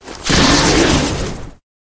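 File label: Rat buff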